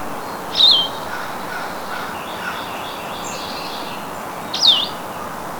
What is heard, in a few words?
vireo